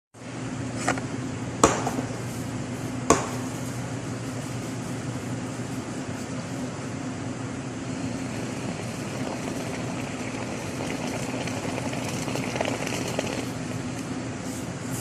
cream; خامه فرم گرفته

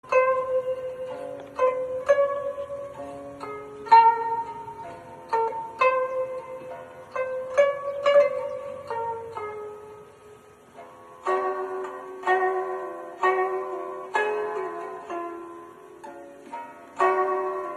selane

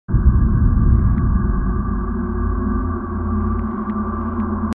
Supernova, انفجار ابرنواختر

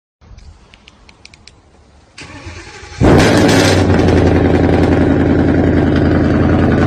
Kia Sonet; ماشین

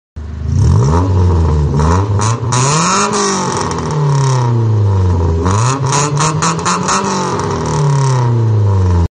L90 exhaust

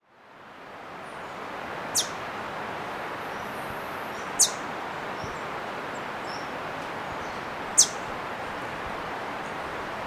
Green Honeycreeper

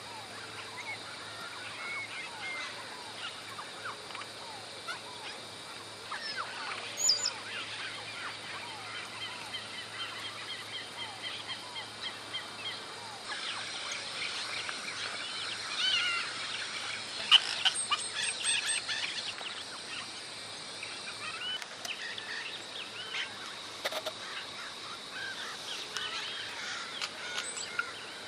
Giant cowbird; پرنده گاو مرغ بزرگ

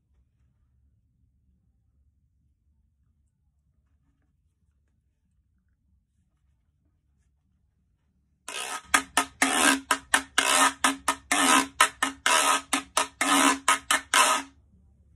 Güiro; گوئیرو

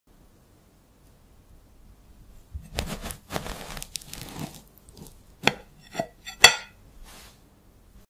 cake cutting

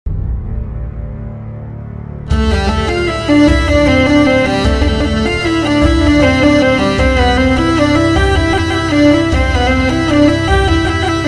hurdy gurdy

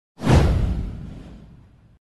transition explosion

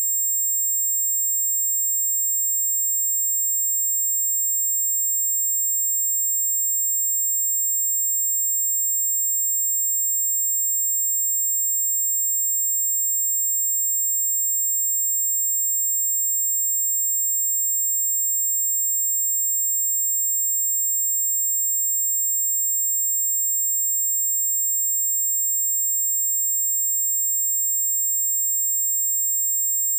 timbre ringing

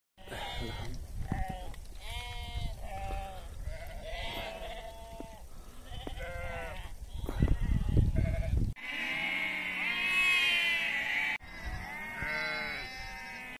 Bighorn sheep; قوچ میش کوهی

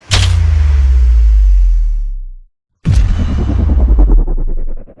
Dropping

